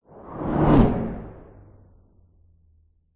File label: science fiction land vehicle pass by zoom fast; zapsplat